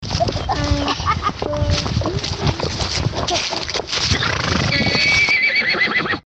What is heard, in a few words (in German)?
Atmo Kinder Hund Pferde; Vogt